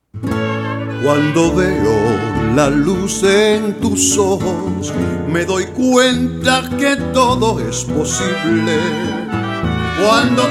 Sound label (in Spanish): Ranchera; Chevy